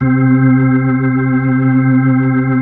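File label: B-3, Hammond B-3 organ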